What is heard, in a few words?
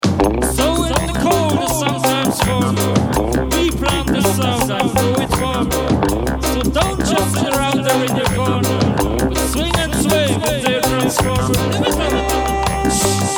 drum song